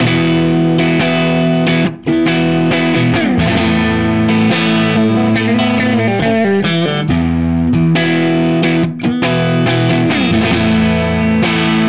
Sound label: Fender Crunch Both PUs